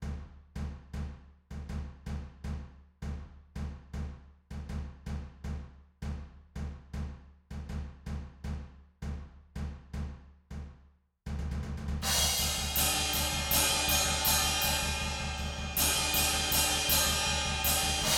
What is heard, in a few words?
Room Notch Out